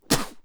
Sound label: spitting